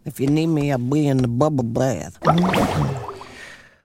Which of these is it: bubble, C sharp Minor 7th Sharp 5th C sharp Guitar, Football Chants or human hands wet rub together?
bubble